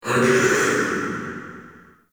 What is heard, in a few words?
Ghost Soldier Vocal Idle; Enemy; Wandering Ghost Soldier